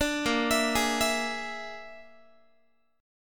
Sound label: Bb 7th Flat 5th Bb, Guitar; Bb7b5 arpeggiated